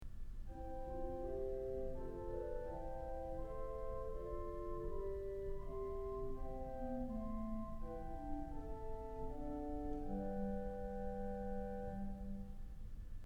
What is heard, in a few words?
Flute; Kellogg Auditorium